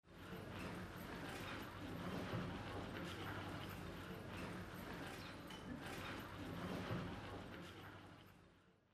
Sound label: Ambience; Age of Empires III; cinematics